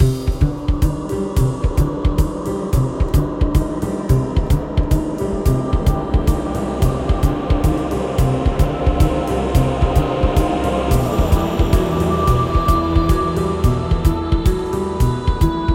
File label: courtyard